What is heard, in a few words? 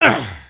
Get Hit; creatures organic